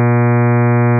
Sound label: triangle random